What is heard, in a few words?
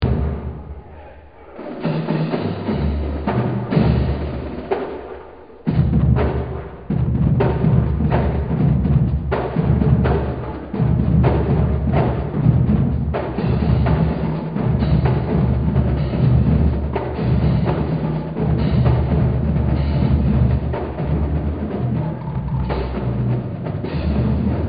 Uptop Cadence; Pitt Drumline Warmups